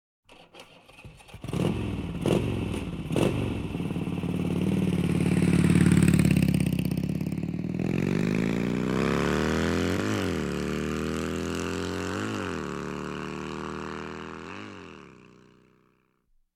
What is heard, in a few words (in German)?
motorrad anlassen und wegfahren; Auto-Zweiraeder